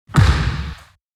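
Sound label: ball bounce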